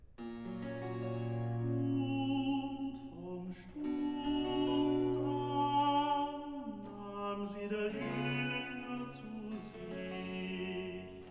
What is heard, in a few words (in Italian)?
Recitativo, Arioso; evangelist Und von Stund an; Jesus Mich Dürstet!; Klassika; Handel Masterworks